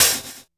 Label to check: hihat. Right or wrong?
Right